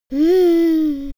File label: little girl dreamy; soundpool